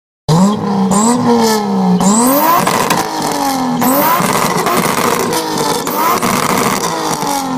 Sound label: ultimate toyota supra turbo sounds